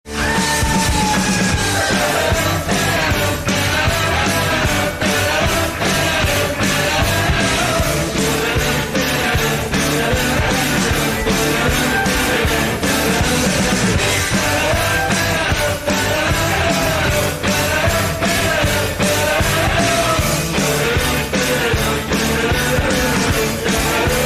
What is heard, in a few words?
goal song; Barkham FC; List of Florian football media